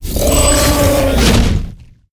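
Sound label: boss attack, monster; Bizen; steamer crazy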